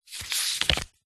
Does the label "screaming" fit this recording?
No